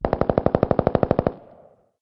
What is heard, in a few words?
M-16; ambient